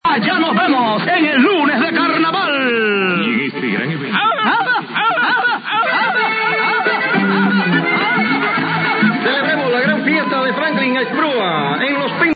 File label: Grito Example, Panama